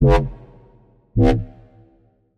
Wobble; SFX - Wobble Mark; Routed Sound; Serum 2 Expansion - Doom Carpenter Vol.1

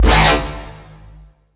Wobble; Wobble - Froggy; Routed Sound; Vital Expansion - Chaos Bringer Vol.1

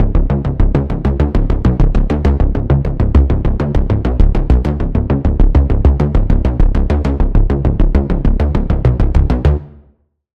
Pulse, Sylenth1; Pulse - The Underwave; Routed Sound; Sylenth1 Expansion - Quantum Enforcer Vol.1

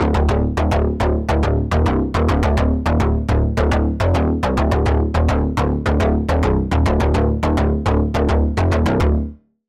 Bass; Routed Sound; Spire Expansion - Doom Carpenter Vol.1

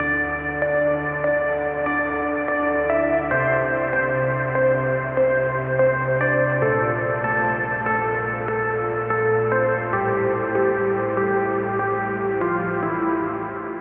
Key - Grey Vintage; Routed Sound; Vital Expansion - Street Vanquisher Vol.1

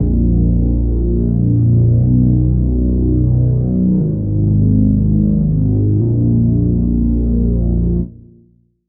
Reese

